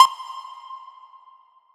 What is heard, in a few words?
Synth Note F